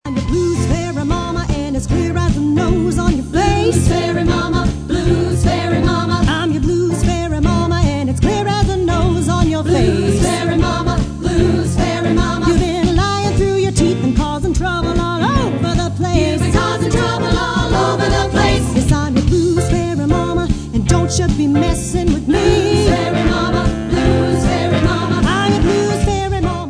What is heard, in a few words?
Blues